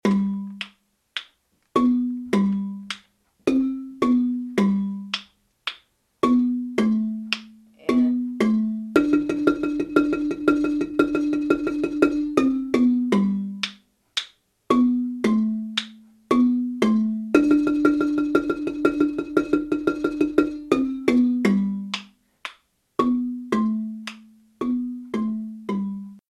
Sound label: baritone high, marimba; kare mugomba